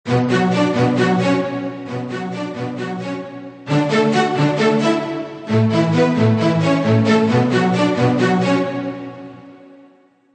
khong loi simplicity xperia

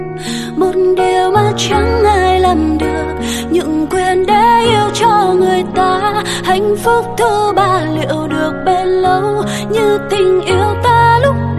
Nhac Chuong Piano Ver

